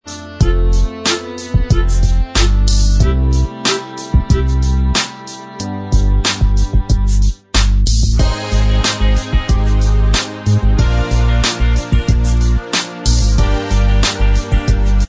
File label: Inst